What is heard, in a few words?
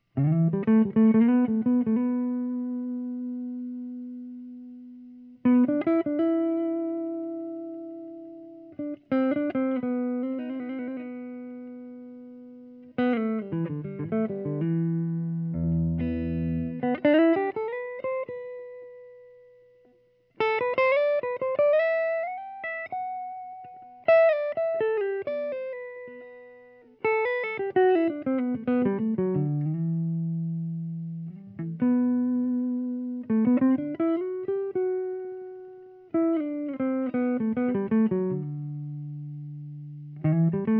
Leads Slow; Improv; Benedetto